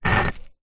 rock or stone movement slight on concrete ground; foley

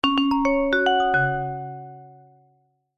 multimedia alert chime short musical notification

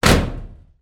impact metal object strike metal garage wall

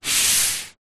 household book slide table

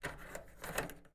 household microwave oven heat control dial turn

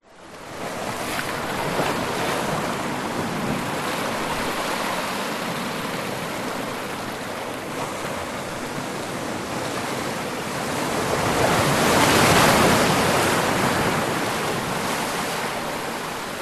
nature ocean waves surf wash up over rockpools

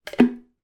household plug put into bathroom sink plughole

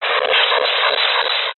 science fiction radio signal interference burst

